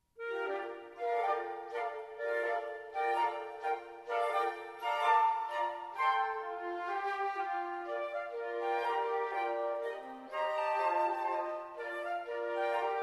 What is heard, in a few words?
Ragtime